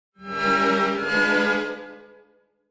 Violins Shrt Reminde; strings

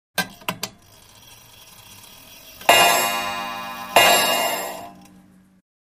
Clock 9 Stikes 2 19th C, chimes

